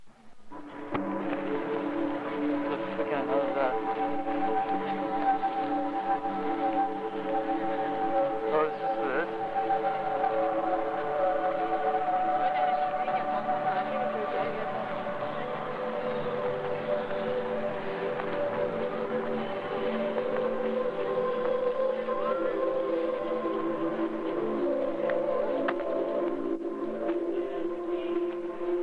Dream Scape On Mini Cassette

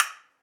Castanet

